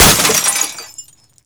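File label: glass break automobile small; Explosions; Call of Duty Modern Warfare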